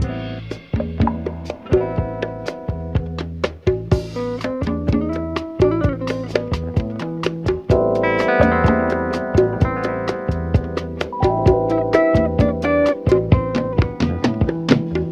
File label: guitar and vibes plus lotsa ticks, Phonky Loops